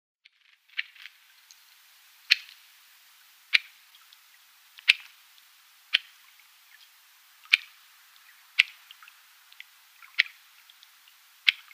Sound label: Bluethroat calls